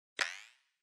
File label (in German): feder; arms